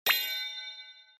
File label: hit